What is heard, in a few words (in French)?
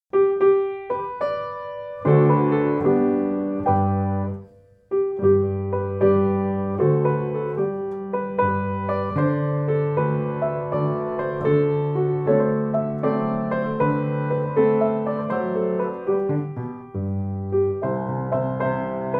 Baîchattes ai mairiaie piano; filles à marier